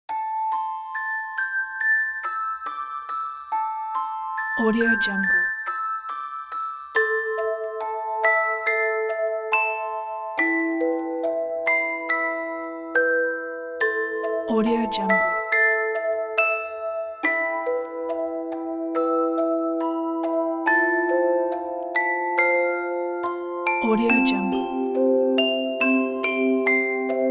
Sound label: a sad childrens fairytale